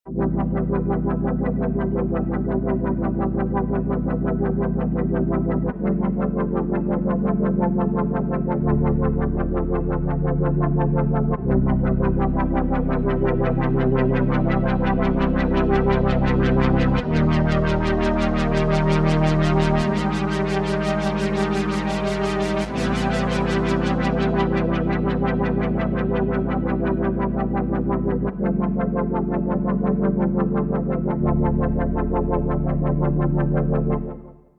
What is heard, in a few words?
Pad